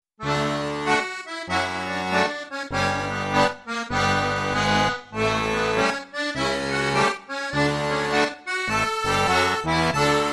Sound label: verbunk d major; old hungarian; rozsavolgyi